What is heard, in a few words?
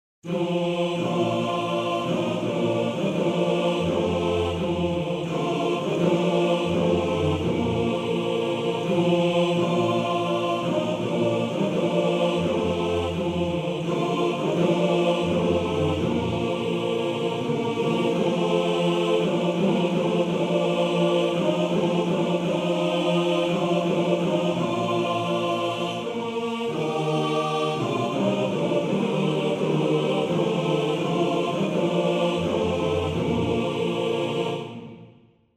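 La haut E Chor, Männerchor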